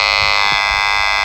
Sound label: incorrect; maximus